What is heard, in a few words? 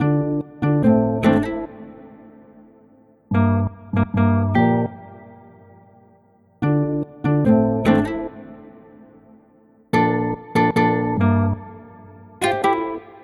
Harmony bpm145